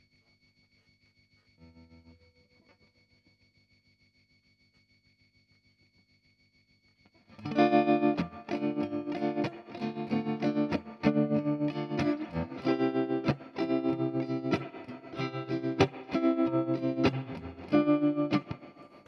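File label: guitar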